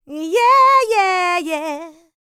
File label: licks, vocal, dance; ILIO; Vocal Planet